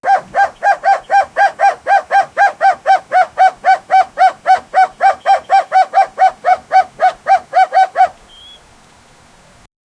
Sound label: two rooster fighting; domestic